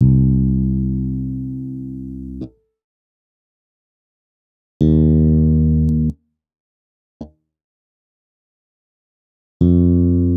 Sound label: Bass Cm Pentatonic